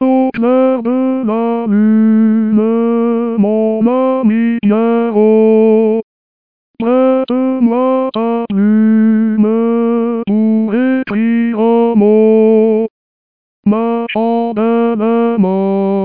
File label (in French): Baryton; Au Clair de la Lune